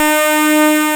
PPG 2.3; DIGITAL1 HYB

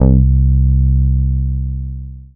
Single Electric Basses, Dance Floor Bass

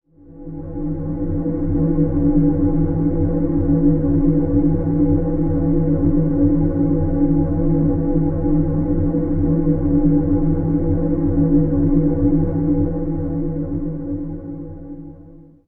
temple drone E, ancient drones; Zero-G Seismic Frequencies; 90 s Sample CDs

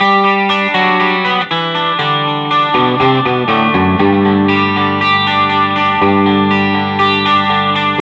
BYRDY LICK 13, Vintage Blues Guitar, 060; USB Soundscan vol.22; AKAI

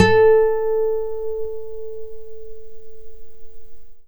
NYLON GTR

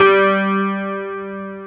HONNER G3, Keyboards Old School; USB Soundscan vol.09; AKAI

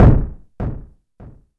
kick, Korg ER-1

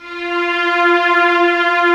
COMBO STRINGS; OMI - Universe of Sounds

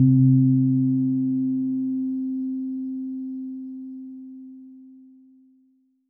Synth Prophet One Shots, Keys C; Electronisounds

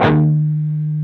PRS PWR, Guitars; PRS PWR CUTS

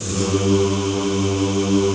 ZZZ G1, Classical Choir; Best Service; Extended Classical Choir

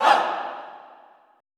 ALL HAH, SHOUTS, Choir; Best Service; Extended Classical Choir

